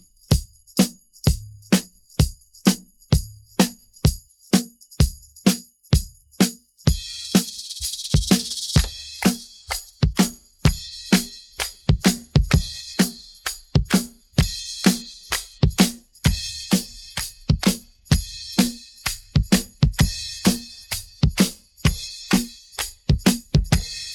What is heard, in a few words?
drums pattern